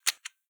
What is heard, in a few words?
m24 insert, weapons